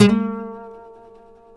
B, Martin, guitars; Spectrasonics; Hans Zimmer Guitars VOL-1; MARTIN HAMMR

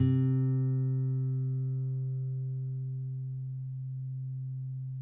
NYL C, FLAMENCO NYL; Spectrasonics; Hans Zimmer Guitars VOL-2

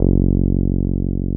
TB 303

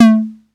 808, CONGA, Electronic

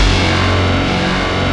PPG WAVE, OLD SYNTHS; Rubber Chicken Software Sample Bank

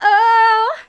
Female vocals and robo-vox

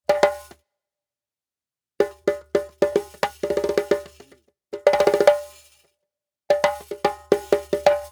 Djembe with Kessing, African

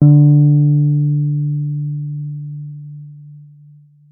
WURLITZER D3; Xsample VOL-1; WURLITZER B

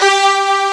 STRG G2, Strings, POP STRINGS

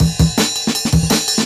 Jungle Breaks

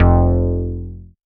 Bass, Synths, 5U Modular

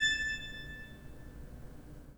PIPE, 3DOrgans

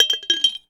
JAR CLINKE; Rarefaction; Poke in The Ear With A Sharp Stick

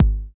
KIK KIK, TR909 Kicks; Club-50 - Foundations Roland; Cheez Kiks

